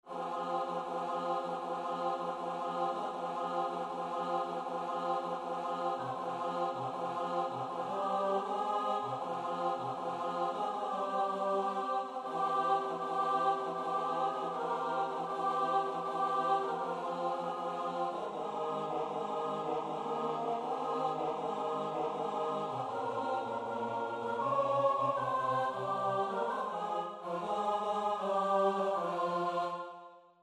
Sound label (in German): chor